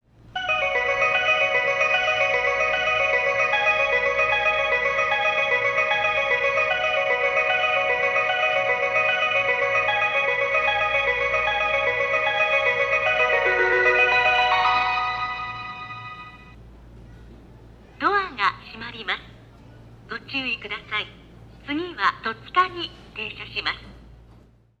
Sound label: ofuna melody; jreast; yokosuka